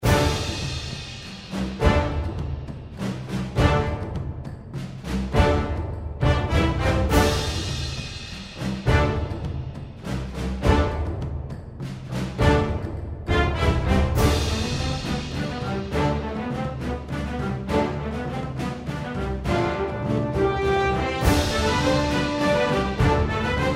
海賊が現れそうな曲; 海をイメージした無料の楽曲です

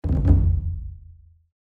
太鼓・ドドドン

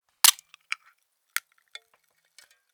缶の蓋を開ける; 日常生活的音效

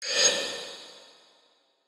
トランジション シャキン

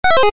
ミス 4連 レトロ

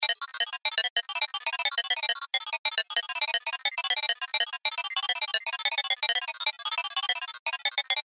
ピコピコ 機械音 モノラル

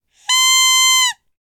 おもちゃのラッパの音

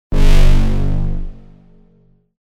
ブォーン モノラル

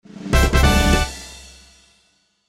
ファンファーレ; 動畫和制作視頻的音效

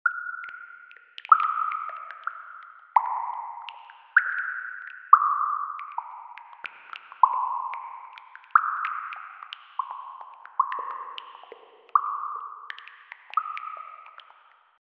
洞窟の中の水が滴る音

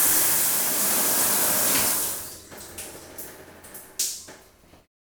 シャワー・お湯を止める音; 日常生活の効果音

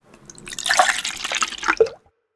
そそぐ

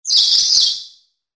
モンスターの鳴き声

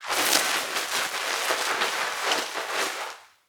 体をあらう

